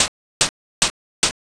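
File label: footsteps poolside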